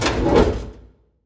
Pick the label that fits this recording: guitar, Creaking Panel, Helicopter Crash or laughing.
Creaking Panel